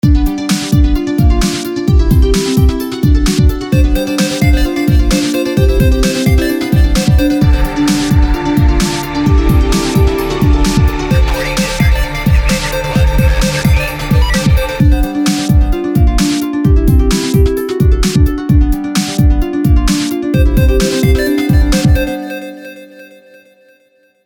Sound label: Electronic Beat Ringtone